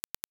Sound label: 3 clicks